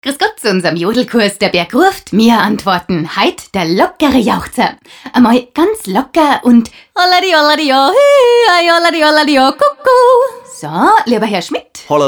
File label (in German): Jodelkurs; TUEV Sued; "Die Jodellehrerin" TÜV-Spot mit Jodler